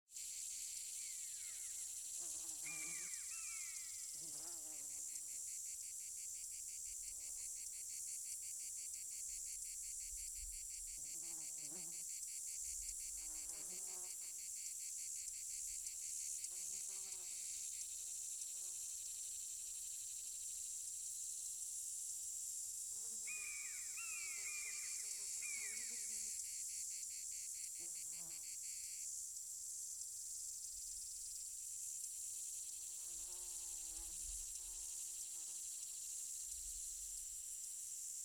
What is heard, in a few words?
Crickets